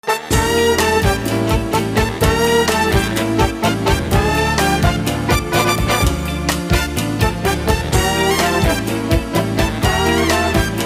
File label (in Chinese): 英文歌曲